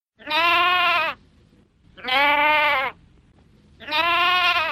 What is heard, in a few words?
Baa Baa